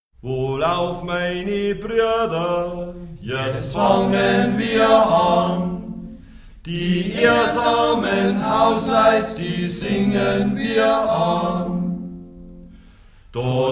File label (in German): Volksmusik Kloepfellied; Chiemgau